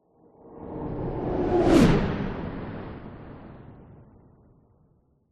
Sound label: spaceship or rocket fly by fast; science fiction